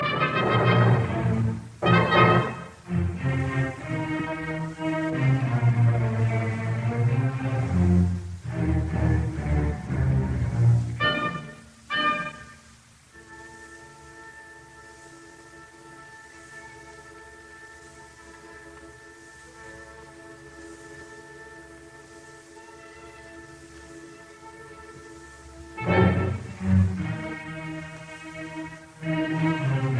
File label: Symphony in D minor Choral Finale Presto; Op 125; Arturo Toscanini